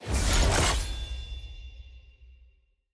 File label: loot reveal portal material key; lol loot